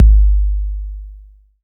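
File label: bass drum 808; Best Service Gigapack 2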